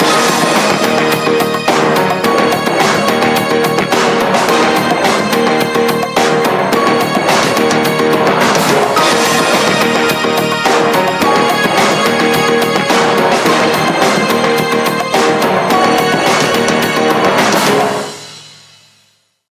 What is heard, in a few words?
Tune Down 2 Business, BlackBerry